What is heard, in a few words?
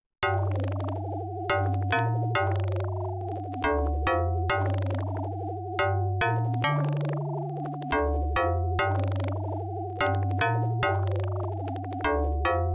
OPL2